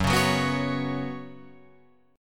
Gb Suspended 4th Gb, down, Guitar, Open F; Gbsus4 strummed

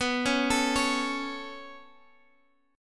B 7th Suspended 2nd B down Guitar Half Step Down

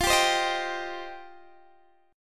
F 7th Suspended 2nd, Guitar Open D

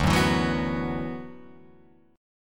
D 6th Add 9th D, Guitar, Open F; D6add9 strummed; 2,1,4,1,2,1; JGuitar

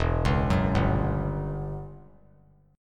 E Minor 6th Add 9th chord; Em6add9 arpeggiated; JGuitar

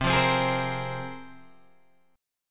C 7th Suspended 4th Sharp 5th, Guitar, Open G

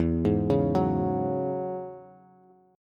E 6th Add 9th E down Bass; E6add9 arpeggiated; JGuitar

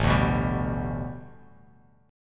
A 9th Suspended 4th Bass Standard, chord; A9sus4; strummed; JGuitar

